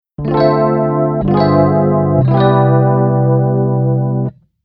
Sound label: Keyboard EP Mod